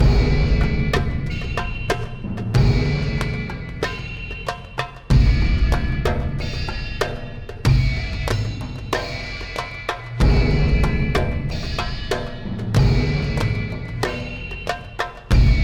Puzzle Loop; The Vale; prince of persia 2008 gamerip

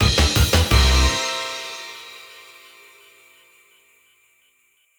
lose stinger; Turbo

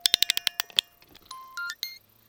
Creepy Music Box; The Doll Death

